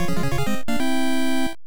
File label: Get an Important Item; Gargoyle's Quest II; NES